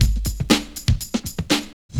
vwoom, breakcore breaks

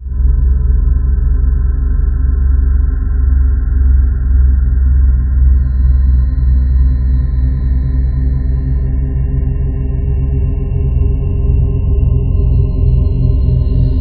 REAKTOR, Ominous Drones; USB Soundscan vol.48; AKAI; LOWTONES

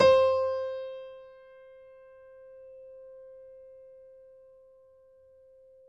piano; East West; The Ultimate Piano Collection

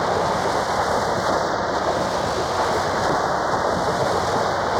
Cracklature, sparse soundscape

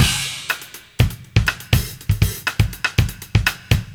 live beat

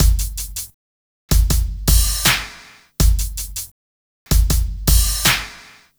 Smooth, R&B Drums

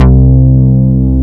MOOG BS; MINIMOOG; Greytsounds VOL-1

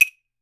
cricket, steel percussion; E-MU Producer Series Vol. 7 – Old World Instruments

